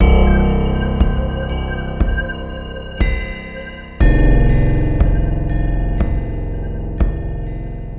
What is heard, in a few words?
dark anticipation